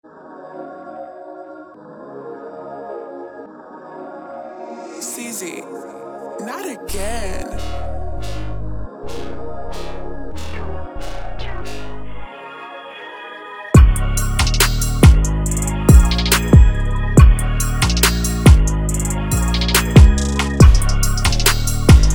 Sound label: rollercoaster